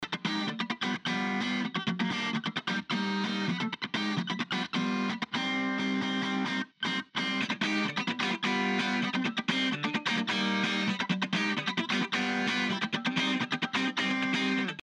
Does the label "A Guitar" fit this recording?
Yes